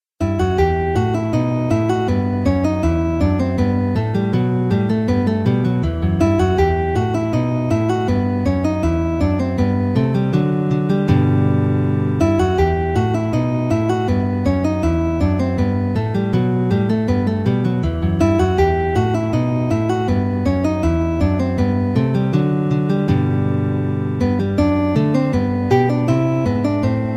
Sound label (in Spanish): suite laud; bach johann sebastian; bwv0996